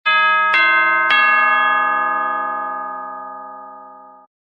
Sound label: chime door ding dong ding